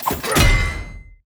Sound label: enemy darksteel Fist stun; sfx